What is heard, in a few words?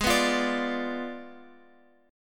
Ab Diminished 7th Ab, Guitar, Open F; Abdim7; strummed; x,x,8,6,8,9